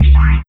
Metals Kick

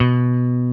BAS; Best Service Gigapack 2

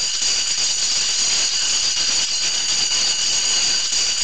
BEATERS; ENVIRN-AMB

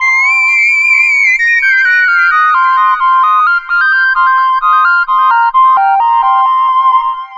Ethereal Blues; Dr. Fink's Funk Factory

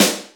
DRY SNARE; Greytsounds; Scott Peers Sound Engineering

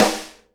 SNARE RIM, Live Snares; PlatinumPhattCD1; E-MU Producer Series Vol. 8 – Platinum Phatt

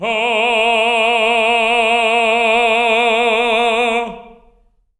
TENOR F A#3; Spectrasonics; Symphony of Voices

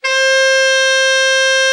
ALT, ALTO SAX; Giga Samples Collection

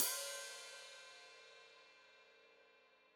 Hat Foot Open, Hi-Hat

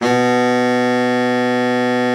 BARITON, BARITON SAX

